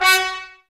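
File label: Brass trumpets F#, Mute; Miroslav Vitous - Woodwind Brass Ensemble; Tps Mute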